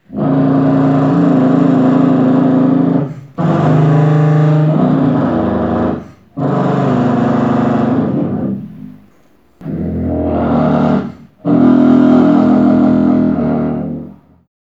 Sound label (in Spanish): Tuba con boquilla de saxo